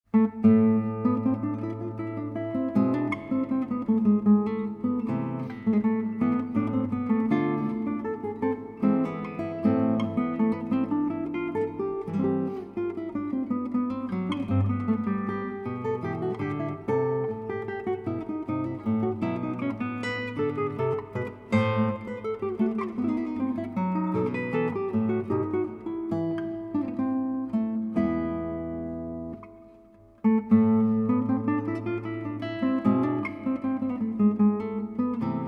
Guitar Suite in A Minor Corrente; Johann Sebastian Bach

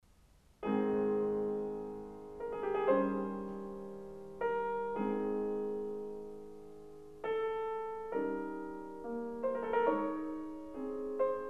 Piano Sonata No. 5 in C minor; Wilhelm Kempff; Beethoven; Piano Sonatas

